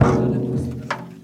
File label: roomy tom single hit c sharp key; Duration 0:01; Samplerate 44100 Hz